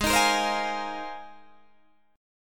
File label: Ab 6th Add 9th Ab, Guitar, Open D